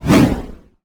Text Message Woosh; SoundsCrate

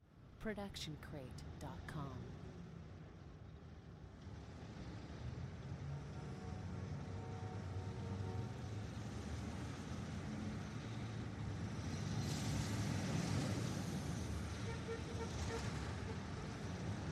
city ambience; soundscrate

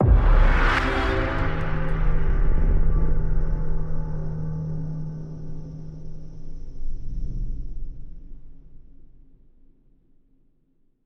Suspenseful Ident; soundscrate

